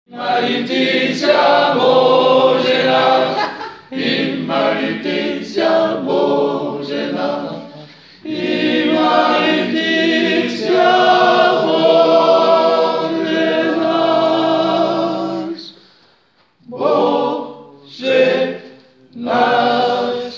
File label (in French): Tibie Paiom en Valaisan, Chants